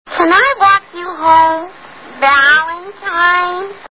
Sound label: walk